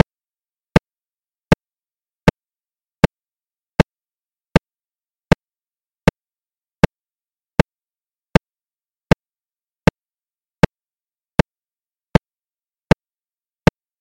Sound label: Click Track